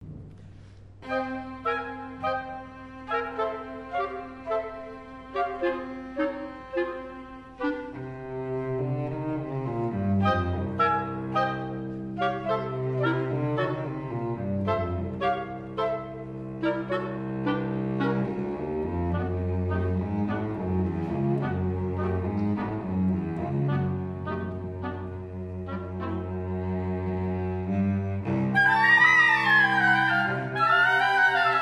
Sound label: kvintet; wiren